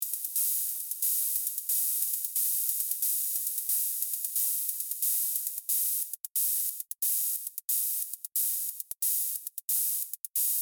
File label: Hexinverter Mutant Hihats; Unterschied OFF EXCLUSIVE FADE